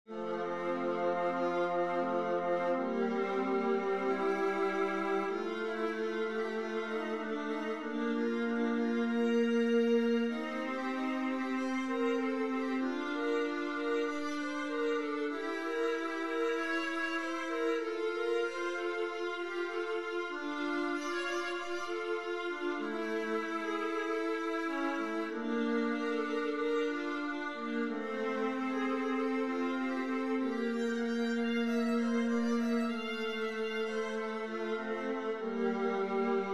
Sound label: viola f major scale duet; scales